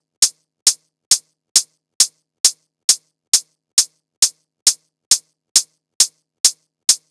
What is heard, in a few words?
Hat Loop 135, Techno